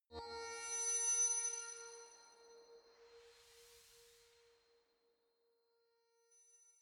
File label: space mysterious chime ring long; sweeps swells; comedy-cartoon; soundpool